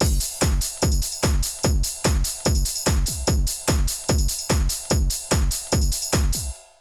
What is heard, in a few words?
Essential beats; FM 57 - The Ultimate Sample Collection (1997); Higher Sauce 1,2,3(147)